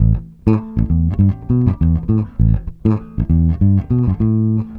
bass cistern, Loops, Phonky

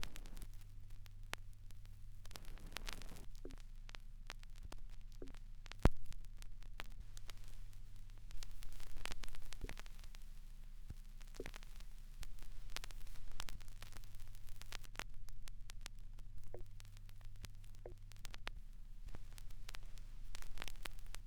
crackles, Vinyl

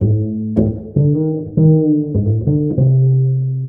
bass, Loops

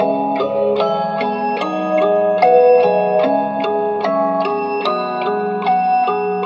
keys

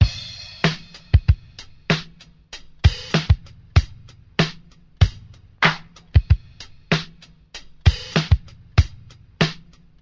isolated clap, Boombap drum loops

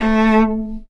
String

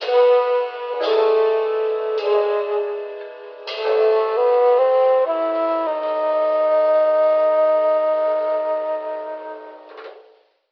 flute final notez

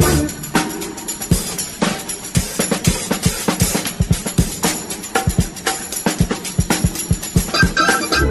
organ leads, Funky Drum loops

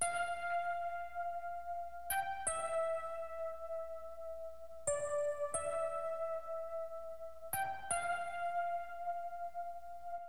keys, Loops, Sad

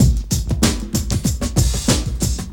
funky drumma, drum loops

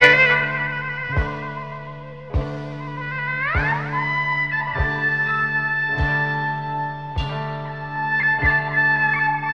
guitar

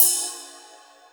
Hats Electro Open

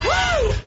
"woo"

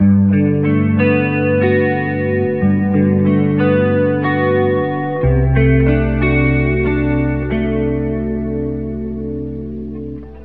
guitar pleasant, Loops

